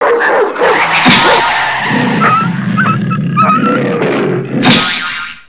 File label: DOG, 狗; 特酷声音素材库